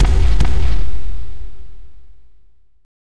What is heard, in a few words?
Robo Run